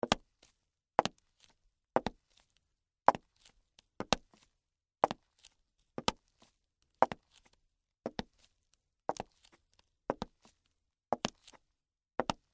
High Heels Walk, Footsteps, High, Heels, Walk, Hard, Wood, Surface, Slow; Footstep Sound Effects; Blastwave